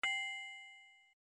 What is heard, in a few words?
bell